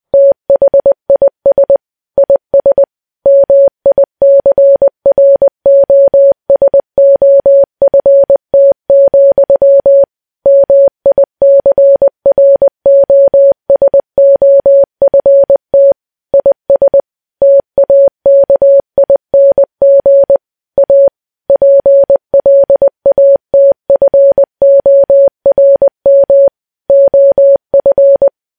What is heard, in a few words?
morse, telegraph 황동 사운더; 미국 telegraph社의 황동 사운더